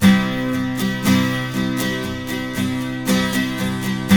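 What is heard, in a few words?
12 string major C#, Guitars; Spectrasonics; Hans Zimmer Guitars VOL-1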